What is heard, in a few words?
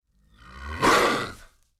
WARN; mahlendur